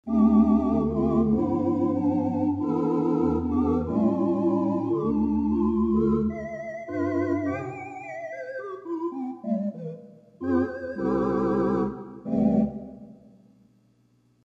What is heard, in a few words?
8 Tibia 2 2/3 Tibia, A combination that simulates the sound of a Quintadena; theatre organ